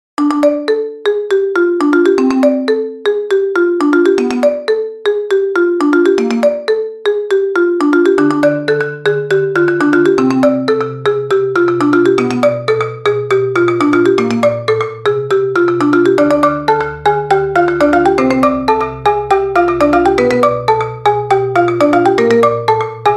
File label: Iphone Song; Undertale; Sans